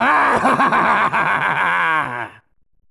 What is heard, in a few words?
kid deathball; buu